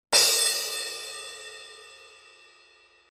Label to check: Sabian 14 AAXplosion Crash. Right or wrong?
Right